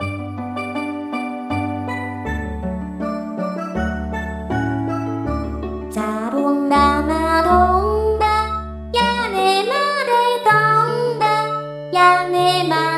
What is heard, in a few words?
soap bubble, vocal; 鏡音リン